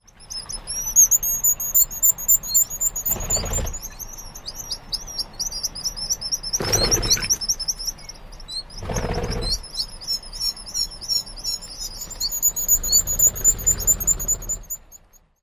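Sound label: peep and whistles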